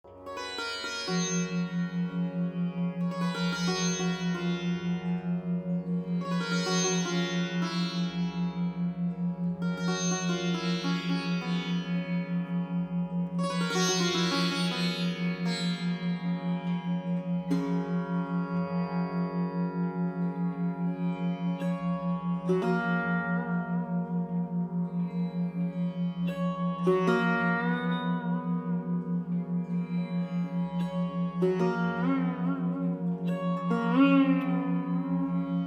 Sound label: 136Hz, binaural, Meditation; hybrid